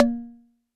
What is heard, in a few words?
E-Perc Rim Synth, Electronic Percussion; One Shots; Drums; Mkit; Ableton Live 12 Lite; Core Library